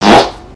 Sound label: fart; megaknight